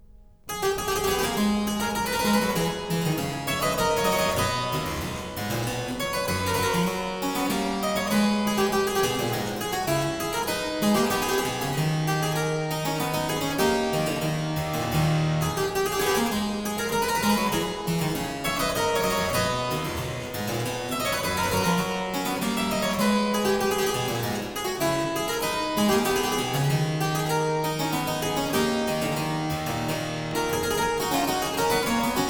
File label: Courant simble in A Minor, Harpsichord; BuxWV 245; Variatio 6; Buxtehude; Opera Omnia; Harpsichord Works